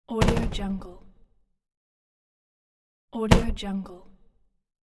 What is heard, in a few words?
user interface mechanical cancelling